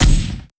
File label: chaingun fire